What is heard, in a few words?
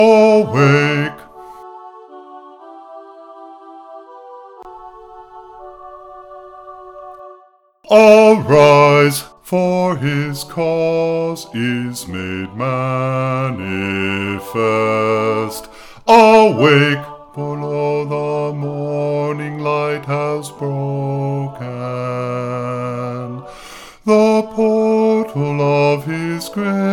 bass